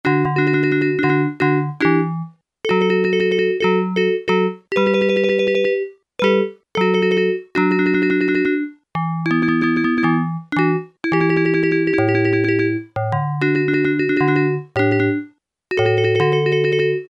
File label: STEEL DRUM, Yamaha SHS-10